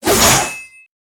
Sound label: miss